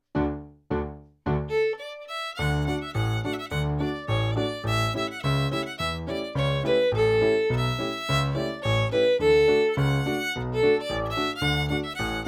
Snow Deer

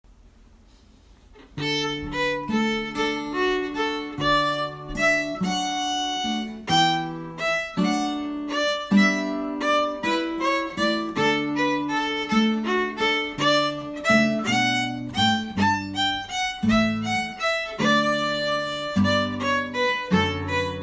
Slow Muck

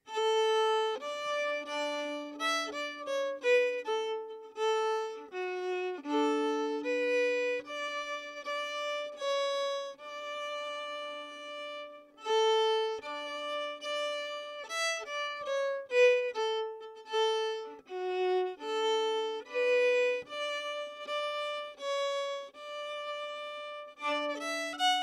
Ding Dong Merrily on High slow

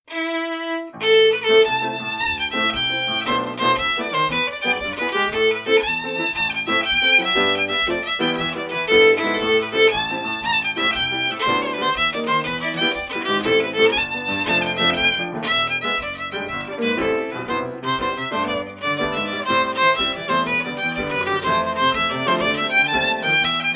Drummond Castle Jig, fiddle

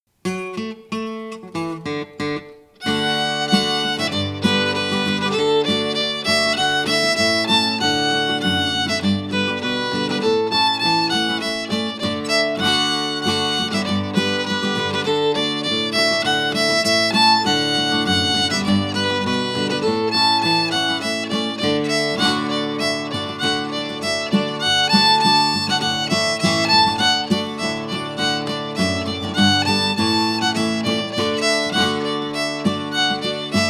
Rattlin Bog

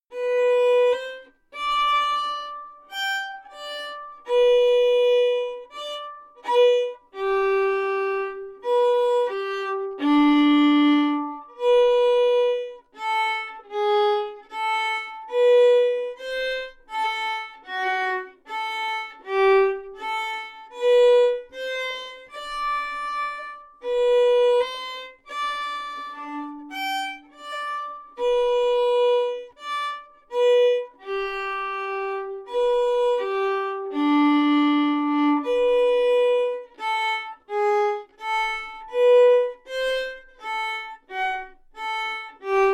Indian Reel slow, fiddle

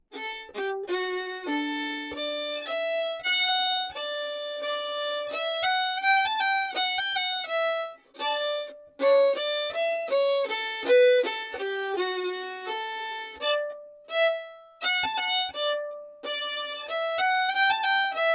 Reel from Joseph Allard slow

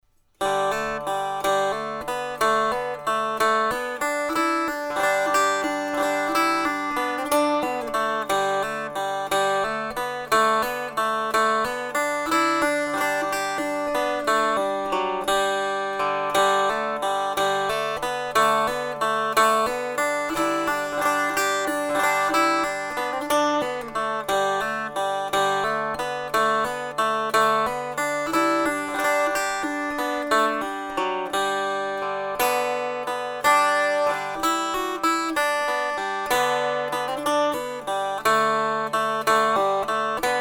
Kesh Jig slow

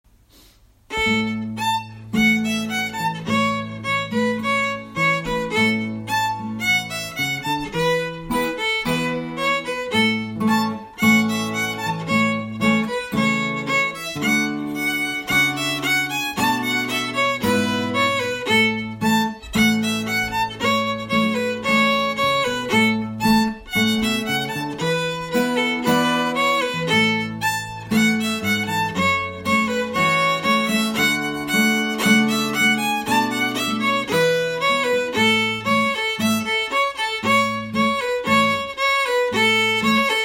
Slow Brown Cow, fiddle

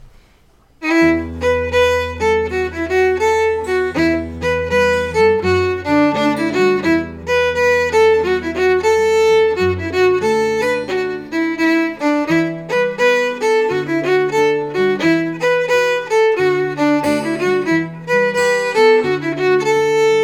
OKeefes Slide slow

